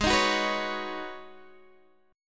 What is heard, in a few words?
G sharp Minor 11th G sharp Guitar Open G, chord